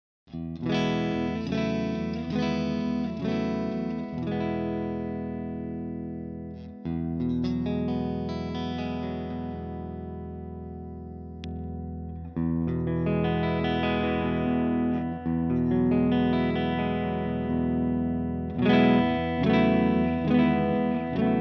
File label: Pure Clean